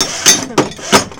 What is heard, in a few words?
HYDRAULIC, Industrial; SFX